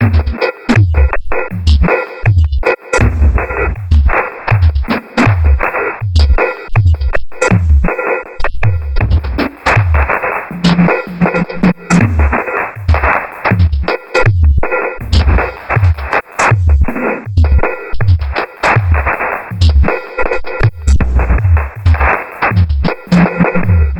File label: 80 Bpm Attack Loop